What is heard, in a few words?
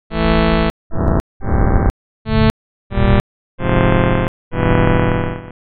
attack sustain decay, synth